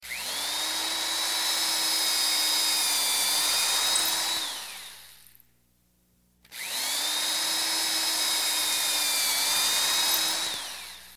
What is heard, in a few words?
Electric Drill